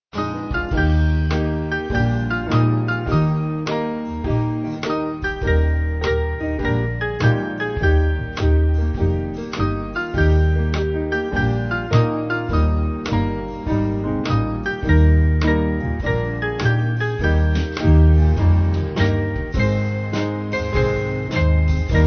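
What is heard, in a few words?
Band, Music Backing Files for Churches; O Day Of Rest; Mendebras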